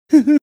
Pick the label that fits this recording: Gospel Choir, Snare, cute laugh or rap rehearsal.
cute laugh